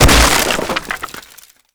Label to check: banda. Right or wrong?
Wrong